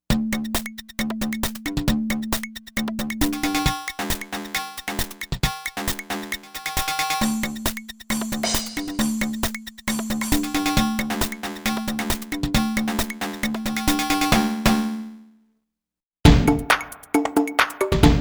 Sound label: Percussionism; Yamaha SMAF demo ringtones; SMAF Entertainment